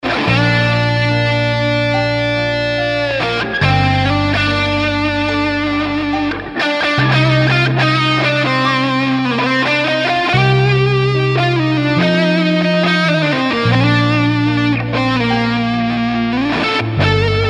Bohemian Guitar

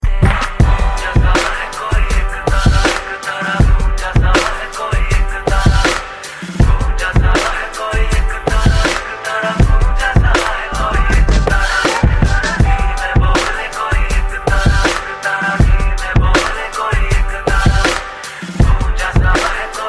Clap Remix

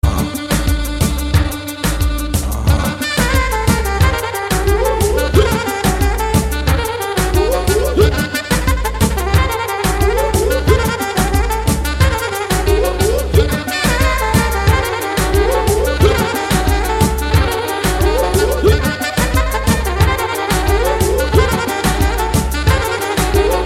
INSTRUMENTALA

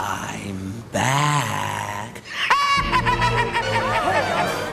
Back Laugh; Joker

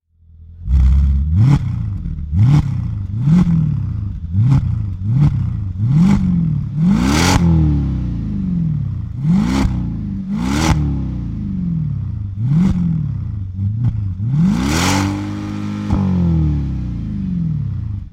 Mercedes Slk55 Amg

